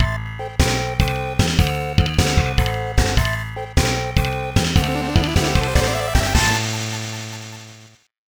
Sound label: Game Over Match Lost; Super Fire Pro Wrestling 2; SNES